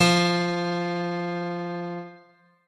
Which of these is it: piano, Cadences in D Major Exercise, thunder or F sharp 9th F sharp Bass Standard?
piano